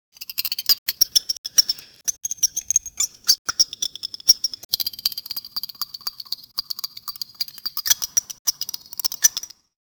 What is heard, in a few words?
plotting mischievous cart, indoor ambience